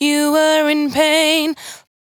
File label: 92bpm Bb, Velvet Vocal; Zero-G